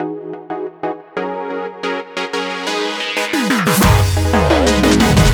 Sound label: intro build